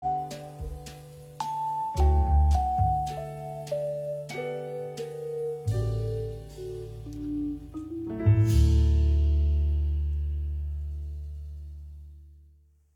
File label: Jazz, Quartet